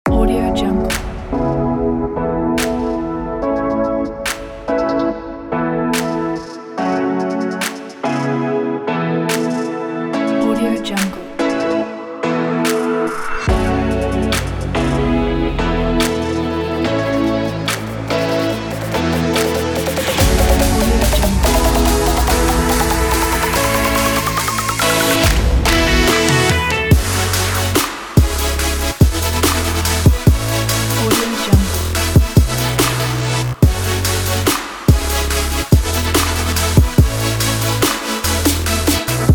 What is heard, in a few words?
future bass kit; bransboynd